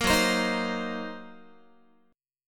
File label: Gb 6th Flat 5th Gb down Guitar; Listen to Gb6b5 strummed